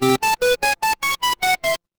retro game power up

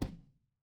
impact punch

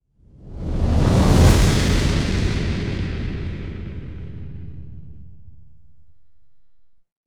fire electric whoosh flame

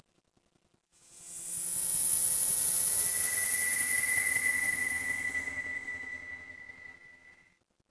horror wind whisper

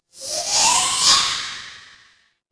transition sweep

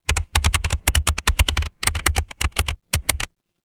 office keyboard

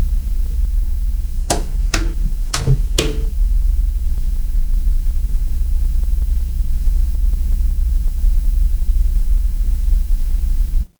ambience room tone

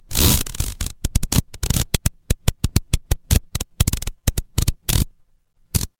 fire electric arc

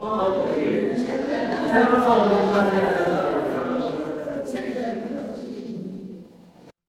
crowd murmur

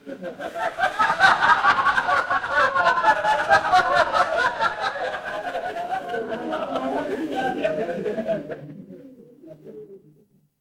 crowd laughter

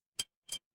feedback achievement

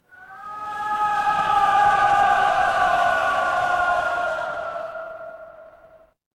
crowd cheer

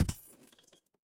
impact crash

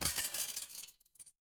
glass shatter

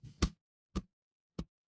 feedback achievement

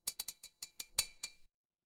glass glass tap

